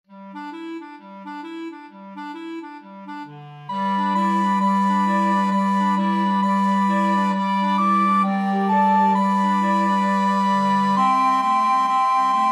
boogie, flexible mixed ensemble 5 players

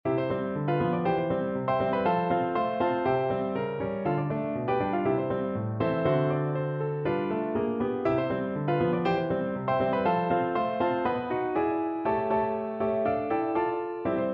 piano

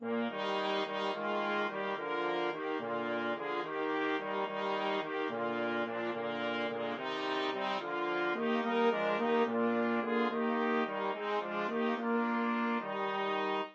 BRASS quartet

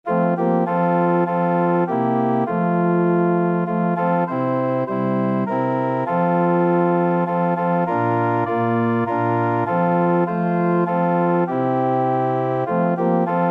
organ

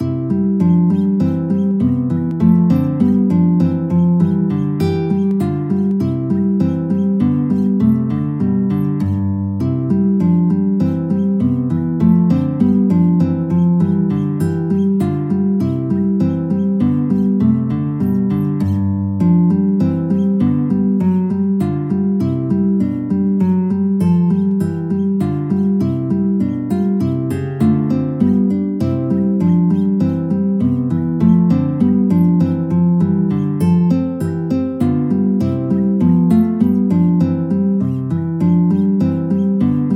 melody guitar; schumann

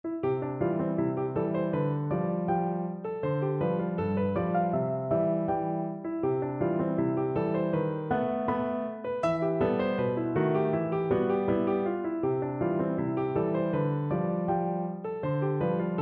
pizziccati, piano

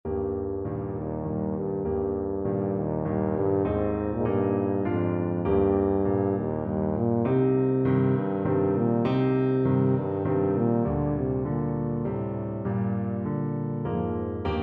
brahms waltz, tuba

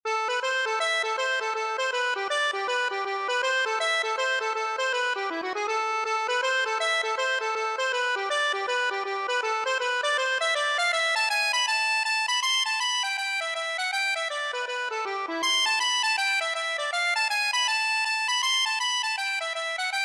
johnnys wedding, accordion

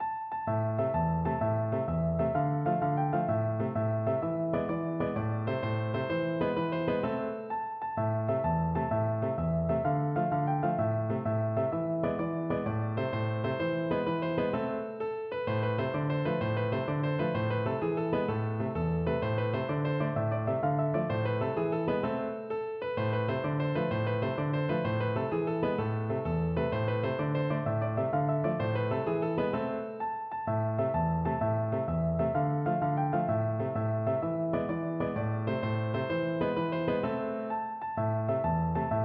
tarantella, piano

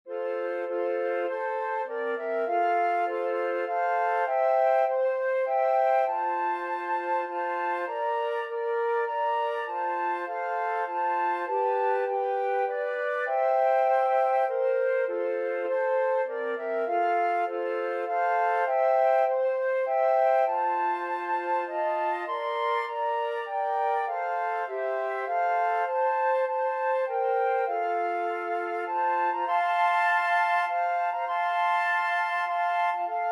god glory, flute trio

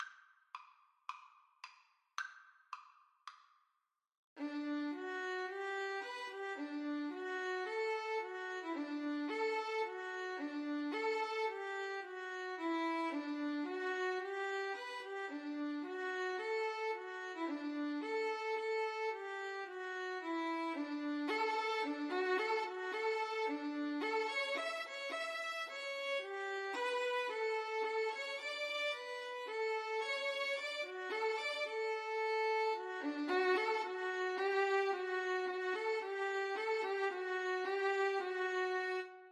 violin duet

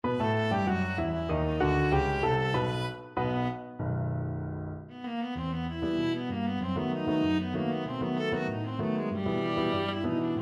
viola

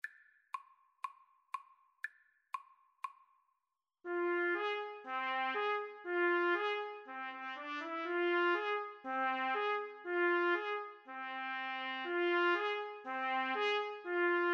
trumpet duet

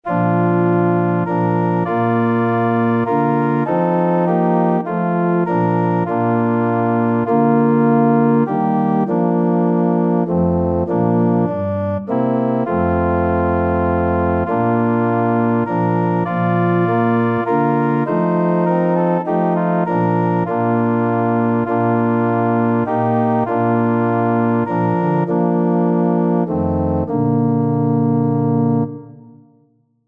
in the cross of christ, organ

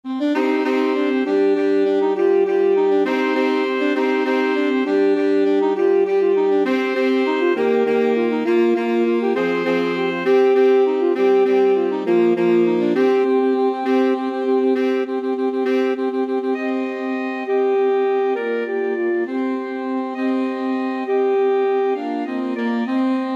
alto sax quartet

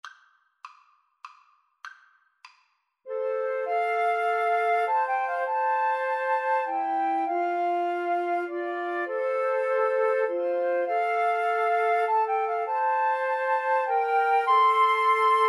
amazing grace, Flute Trio

